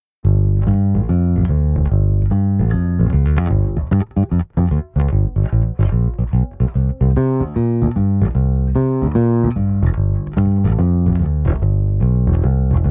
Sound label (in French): doigt, Bow Precision Bass